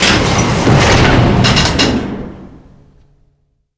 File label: airlock close